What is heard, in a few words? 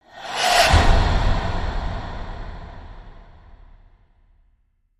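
Hit Swoosh Breath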